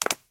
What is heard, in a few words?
marble land; Golden Crayon Sounds